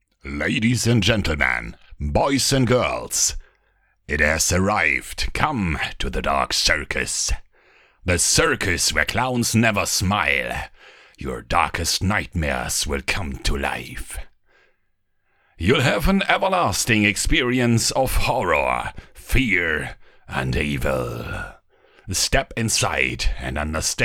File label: Circus Normal